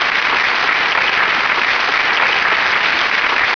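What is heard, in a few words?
applause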